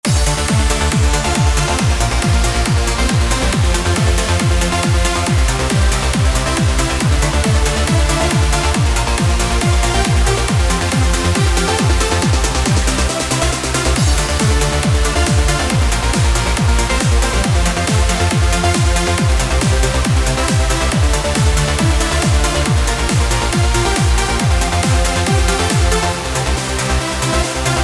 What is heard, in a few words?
Kit trance; trance construction kits